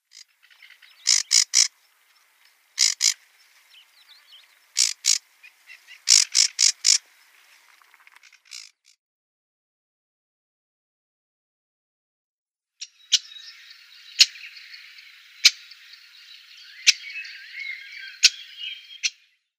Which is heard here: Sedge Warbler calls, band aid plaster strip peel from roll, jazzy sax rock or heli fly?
Sedge Warbler calls